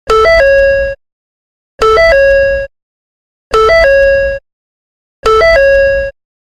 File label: warning door chime, ford